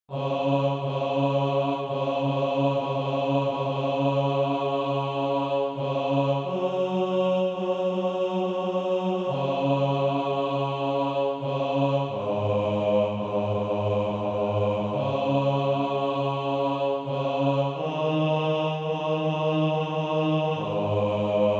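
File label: bass, Practise Music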